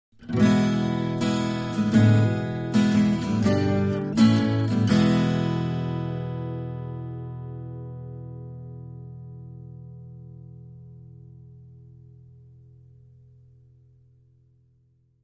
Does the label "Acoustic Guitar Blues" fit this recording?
Yes